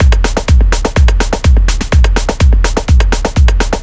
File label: 125 drum loops; SteamTrain; M8; Electronisounds